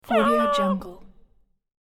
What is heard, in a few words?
cute baby dino sad moan; cartoon; cartoon sounds